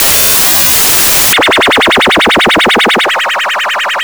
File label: LFO seq; Big Beat Samples & More; AKAI; ESO SEQS KIT